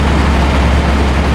drive loop, armored car

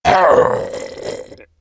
die; slender; zombiebm

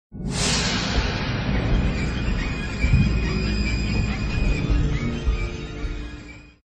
scare; slender; weeping

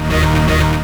melee frying pan; tron mod; weapons

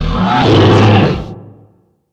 garg roar short; slender

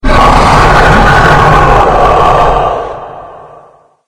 hk chatter; slender; hellknight

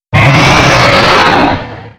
die; slender; hellknight

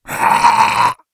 pain; slender; zombiebm

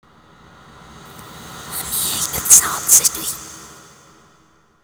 reversed; slender

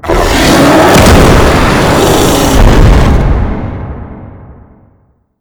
roar; slender; quadralex

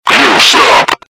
alert; slender; gunner

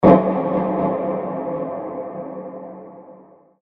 scare; slender; sisters

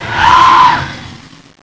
die, horror; slender

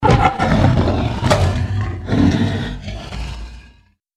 deathclaw alert; slender

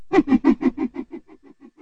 laugh; slender; bendrowned

